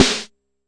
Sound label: Jomox MBrane, drum machines; Jomox MBrane 11